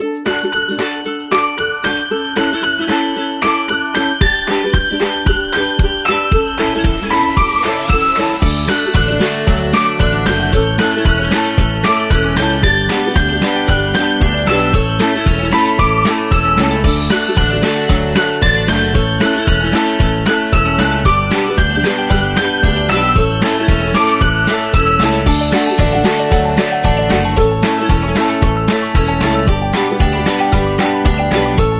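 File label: Energy Happy, Upbeat and happy background music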